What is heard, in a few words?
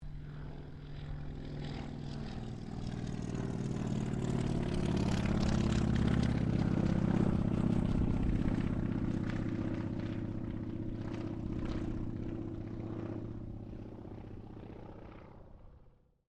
AIRCRAFT CAC WIRRAWAY PASS